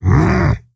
Stonebark Attack; Elder Stonebark

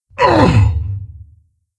Wound, Creature; Steelbreaker

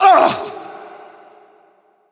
Wound; Bolvar Fordragon

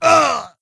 wound; Skadi The Ruthless

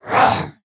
Attack; Tenebron

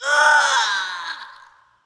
Wound Critical, Creature; Fjola

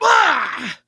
wound crit; Mage Lord Urom

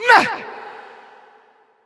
Attack; Creature; Bolvar Fordragon

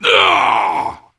Attack Heavy; Creature; Varimathras

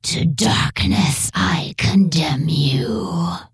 Breath; Creature; Tenebron

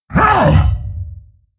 Attack, Creature; Steelbreaker

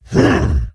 Crusher Attack; AnubarCrusher

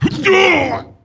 Wound Heavy; Jaraxxus

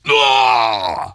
Wound Medium; Putress

